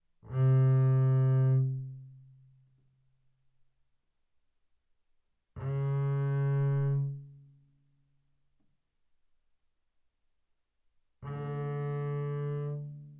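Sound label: Bass arco sul D fortissimo C3 Bb3, double bass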